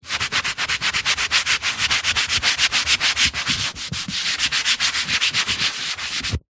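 household paper towel tissue kitchen roll rub clean wood kitchen cupboard door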